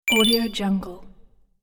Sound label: classic coin pickup